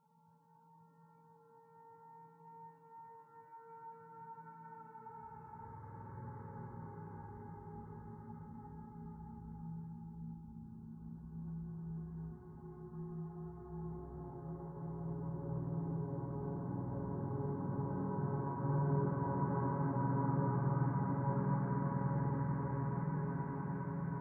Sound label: Pad Second Interior One